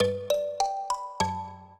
minuet, mbira; Mozart

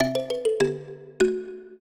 trio, mbira; Mozart

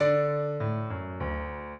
piano; Mozart

